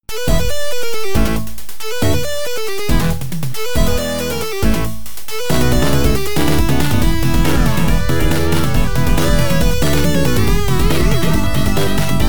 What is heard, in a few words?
High Score; whirlwind williams pinball